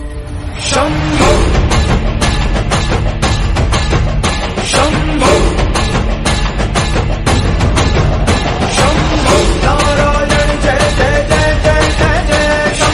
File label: Marathi Ringtone